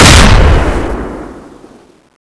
shoot, weapons; cso

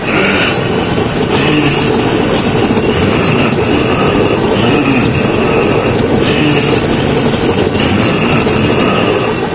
walk; doom3; sabaoth

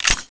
clip in; tfa cso; m249p

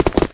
evade left no vo, wraith; doom3

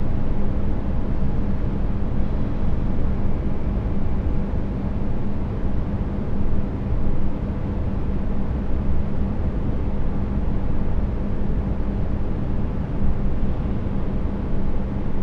underground ambience; undergrounds; soundscapes

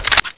tec9 mag out

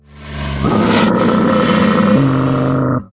worm idle growl, giant worm

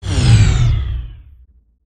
securitybot poweroff; gow

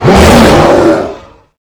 shout long; morbus

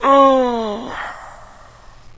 zombie death; doom3

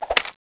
idle, weapons; dual uzi; tfa cso

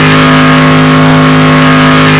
simulated vehicles mid

